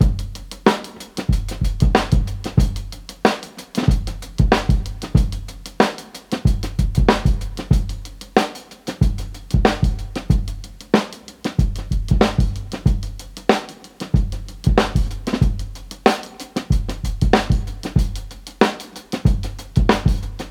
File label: Break; Kount's Reel Break Library